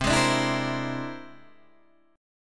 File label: C Augmented 9th, Guitar, Open D